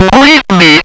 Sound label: BLEEP